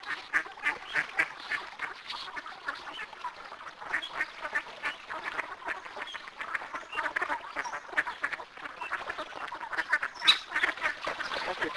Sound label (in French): canard